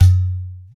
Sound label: PER Percussion